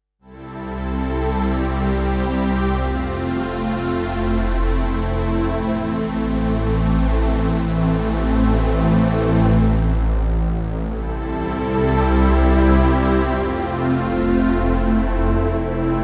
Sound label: pad moving front back; Roland Integra7